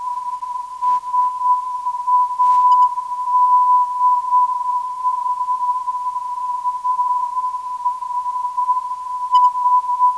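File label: 348kHz, non-directional beacon; SAD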